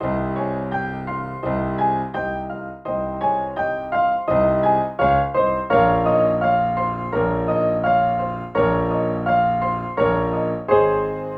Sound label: Piano; Made for the Soul